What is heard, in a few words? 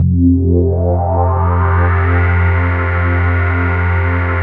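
JP8 filter sweep, Jupiter 8; Big Fish Audio - First Call Vintage Keyboards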